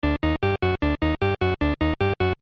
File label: song loop; Wreck-It Ralph